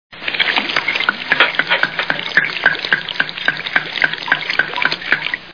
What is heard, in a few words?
glug glug; three stooges